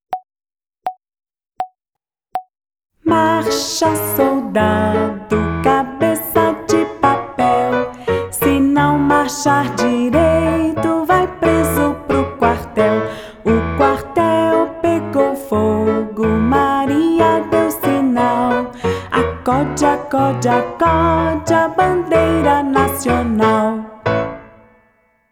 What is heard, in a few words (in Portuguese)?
Vocal; Marcha Soldado; Violino para os Pequeninos